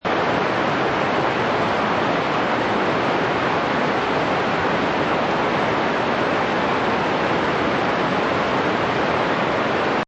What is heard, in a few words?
Rain Roof Interior Perspective Loop, Rainfall heard from inside. Heavy rain falls on roof; Heavy rain falls on roof, interior perspective. Seamless loop.